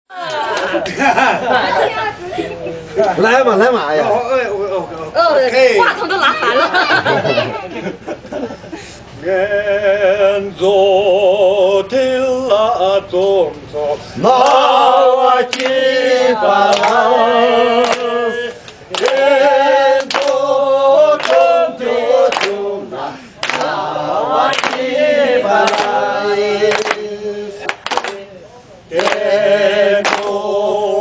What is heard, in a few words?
Tibetan dinner song